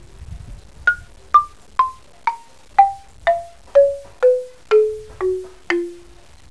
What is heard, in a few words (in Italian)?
musica strumentale; instrumental